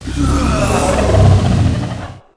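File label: Monster, Spooky Sounds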